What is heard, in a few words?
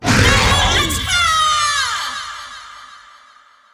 priestess win; netent